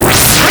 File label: Shield Deploy Charge; Warframe; Capture Targets